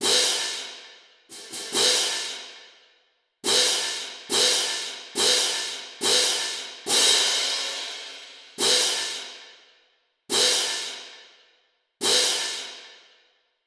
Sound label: cymbal